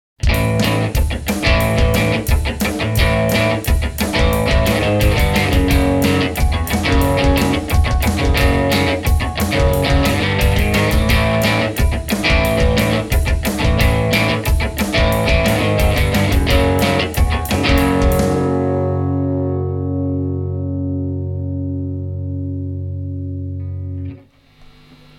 firebottle vari z; effectrode

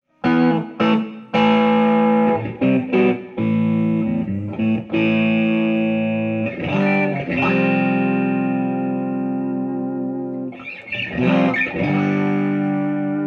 clean pushing amp

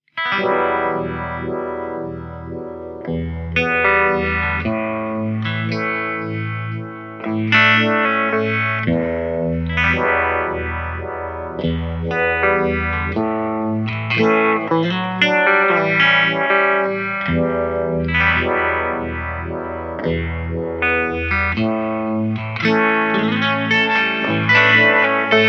breathe tube vibe; effectrode

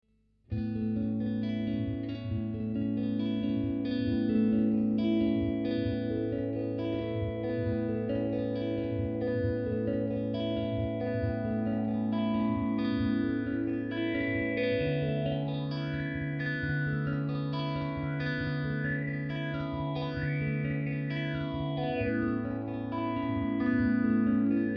manual lfo; effectrode

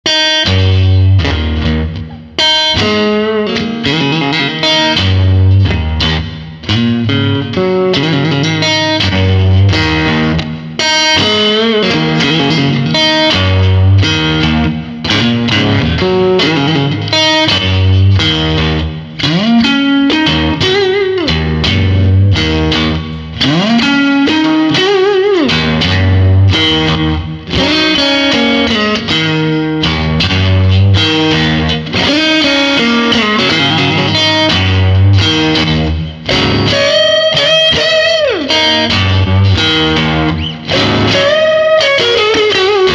fire bottle subtle boost; effectrode

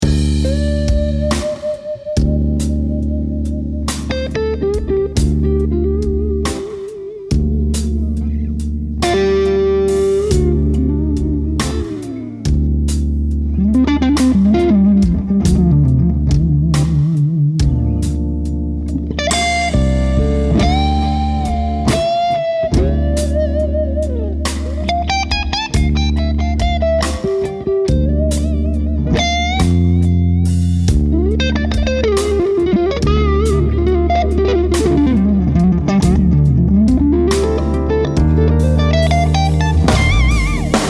low gain; helios; effectrode

